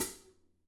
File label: Pedal; Digital Sound Factory